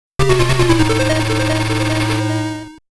Miss; Legend of Joe Musashi Shinobi Music Collection

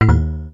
boo; Dot Line

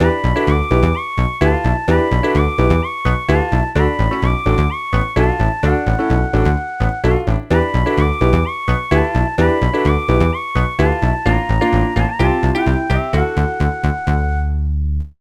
Disco

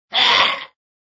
sound ouch medium; Monster Arena

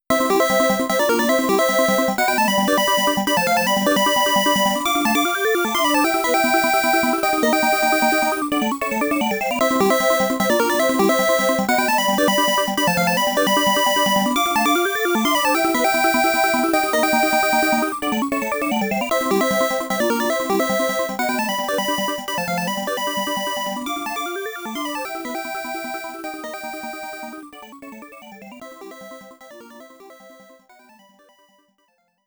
High Score Music; Gaplus

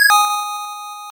Logo Jingle; Kids Communication Pico

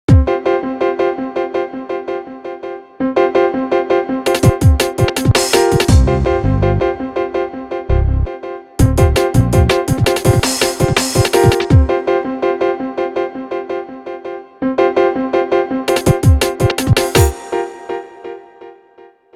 Strange Ending

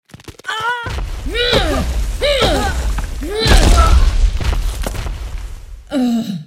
Trample Stomps; Goddess of Trampling; Uzuk

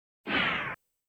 BOOST LOST

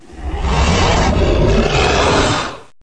spawn boss; Repatitis

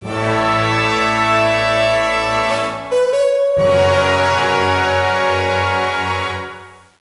King Victory; Lego Battles 2009 NDS

